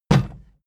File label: plastic box big kick rattle